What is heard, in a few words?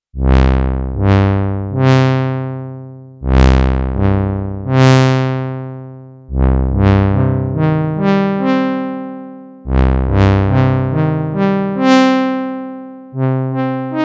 Nord Stage EX vs Nord Stage 4 Saw